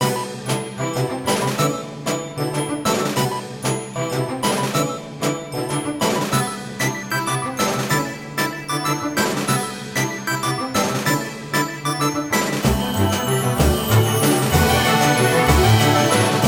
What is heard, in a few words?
sea battle depth normal